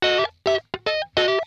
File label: PLUK, Funk Guitar; 90 s Sample CDs; Zero G